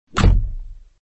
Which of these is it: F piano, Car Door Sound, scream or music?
Car Door Sound